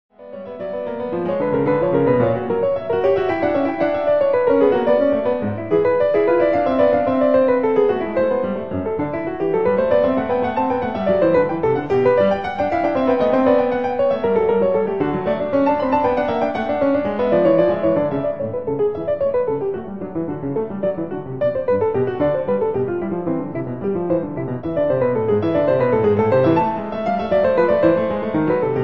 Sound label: Clavier E-minor Fugue; The Well-Tempered Clavier